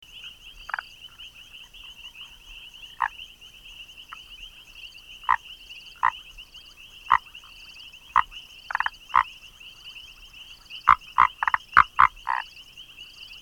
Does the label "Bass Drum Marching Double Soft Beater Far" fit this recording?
No